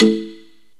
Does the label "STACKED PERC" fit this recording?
Yes